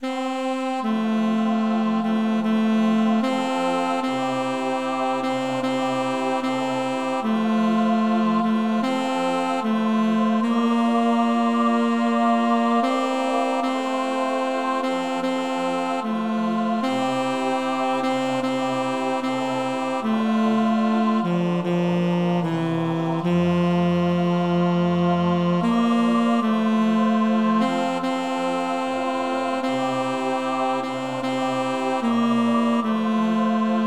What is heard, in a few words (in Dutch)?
Auld Lang Syne tenor; Concert Friends